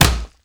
Inf Weapons Foley; Red Orchestra Ostfront 41-45

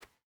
footstep barefoot cloth jump start; Rust

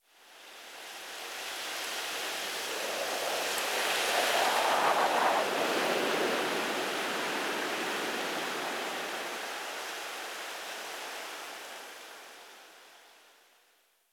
wind leafy whistle

